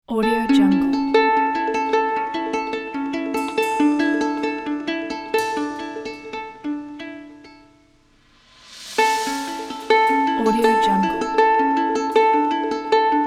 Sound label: inspiring ukulele